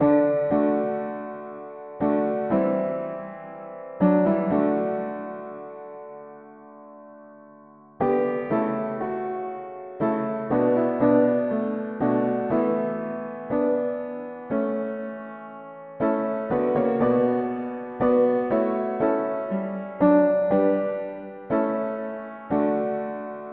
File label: mono piano; hymns